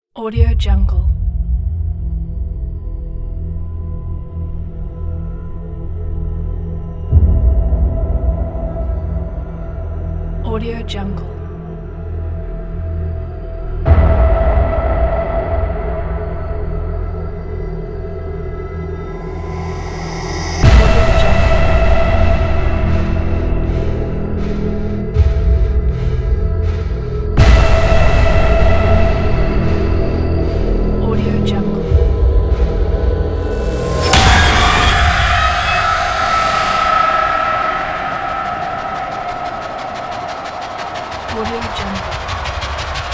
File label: action horror trailer